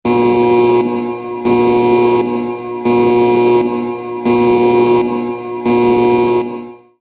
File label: Max Alert Alarm